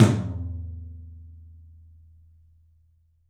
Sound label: 13 TOM, 3D Drums; 3D Sound